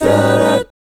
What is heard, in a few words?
A13B5, GUY CHORDS, Vocal, Jazz; ILIO; Vocal Planet VOL-3; Jazz & FX